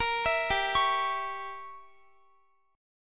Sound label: G Diminished 7th G down Guitar; arpeggiated; 4E,4A,5D,5G,5C,6F; Gdim7